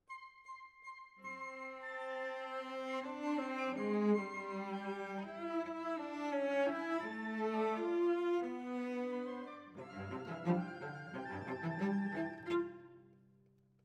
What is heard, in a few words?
Cellos